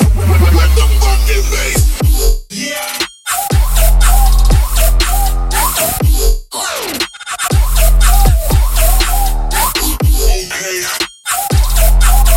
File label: Hybrid Trap; Broesh x Qokka Hybrid Trap; Qokka & Broesh - Hybrid Trap Sample Pack; Dubstep&Hybrid风格采样包